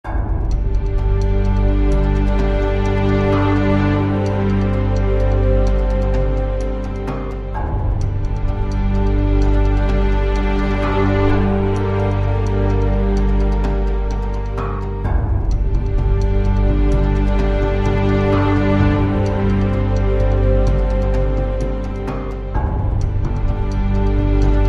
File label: No Piano Less Keys